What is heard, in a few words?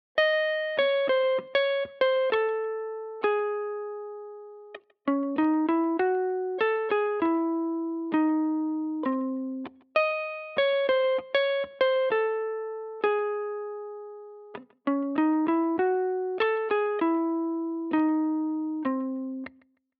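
music guitar loop 98 bpm riff dry key C minor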